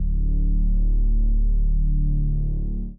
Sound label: Reese D; 99Sounds; Monosounds; Music Loops; Instrument Oneshots